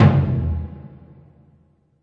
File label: big burundi, African Burundi Drums